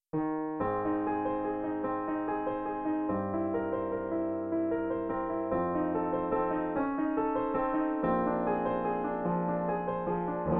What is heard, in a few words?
performed midi